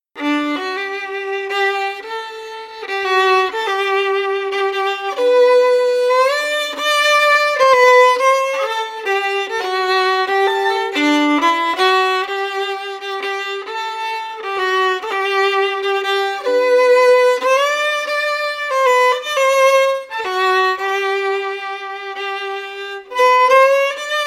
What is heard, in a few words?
fiddle; boreen bui; SeamusGrantFestival